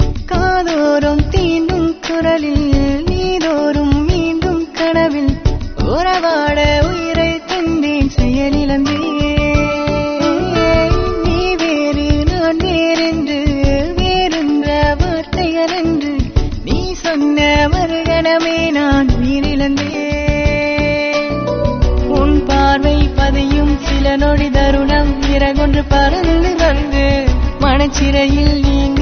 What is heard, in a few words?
Malaysian Tamil